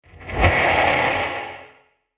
Monster Scream Transition; Scary Soundscape; Horror; Crafted Signals

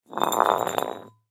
Wine Bottle Slide Cement; Foley; Blastwave

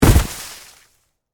Body Fall Snow; Horror, Body, Fall, In, Snow; Blood and Guts; BlastWave

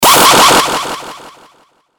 Hit High Delay; sound design; Blastwave

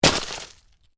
Ice impact heavy, Ice: heavy impact with debris; Snow; Weather; Stuart Duffield

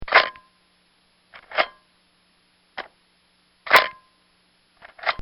35Mm Camera Manual Shutter; Camera Sound Effects; Electronics; Bobby Cole

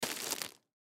Bread Loaf Pick Up, Bread Loaf Pick Up Bag; Bread Loaf Pick Up Bag Movement Food; Foley

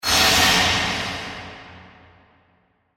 Whoosh Metallic, Accent, Transition; Production Element; Imaging Element; BlastWave

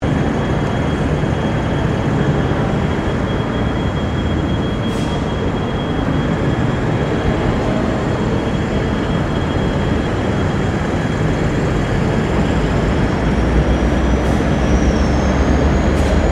Ambience Railway Station General; General Ambience at Southern Cross railway station; Train Station Sound Effects; Foundation1

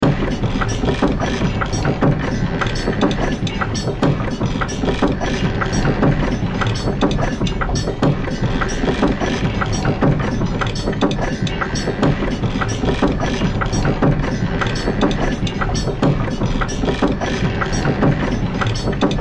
Sci Fi Workshop Machines Mechanical Steampunk; Metal Small Movement Inventor Clanks Clicks Clock Loop; Tech; Blastwave

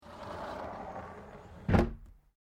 Window Aluminium Sliding Small Close; Windows; Household

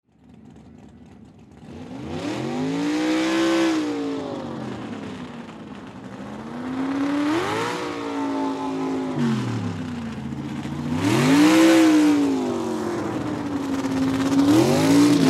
Swamp Buggy Pull Up Revs; Transportation Watercraft

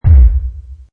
Distant Giant Or Dinosaur Footstep Mono; Heavy footstep from fantasy creature, elephant or other heavy animal; Halloween Sound Effects

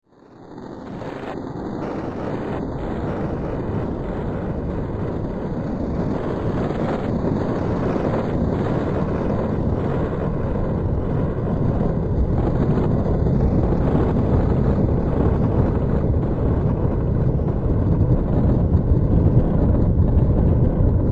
Epic Fantasy Storm Rumbles; Scary Soundscape Sound Effects

